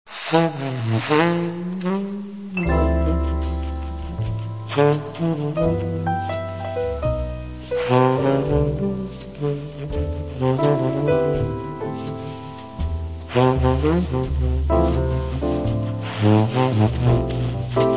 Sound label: soulful sax